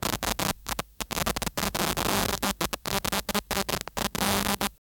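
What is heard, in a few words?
old tape with interference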